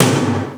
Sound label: Tom Process, Korg 05R-W Korg Toms; 300 Drum Machines